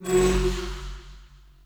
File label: Choir Chorus Aura Power